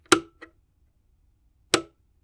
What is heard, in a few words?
Model D - Switch, keyboard